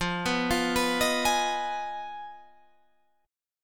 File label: G sharp Minor 6th F